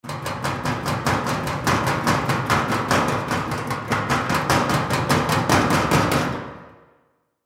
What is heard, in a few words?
Impact Metal Locker Hit Multiple; Sound Effects:Materials; Blastwave